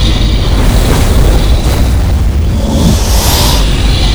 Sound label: key main, monster